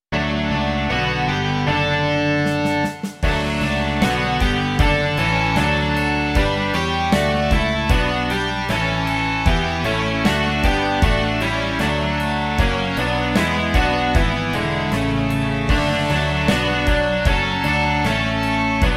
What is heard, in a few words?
rock